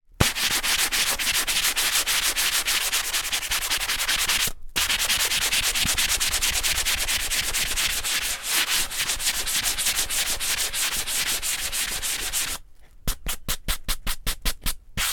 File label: industrial sanding block rubbing wood